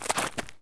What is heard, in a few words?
turn concrete; Escape from Tarkov